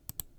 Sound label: Mouse Click